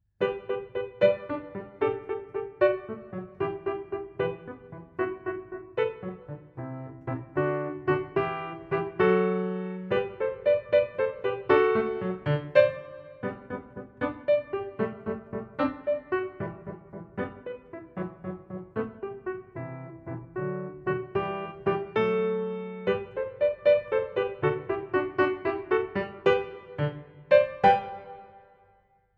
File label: piano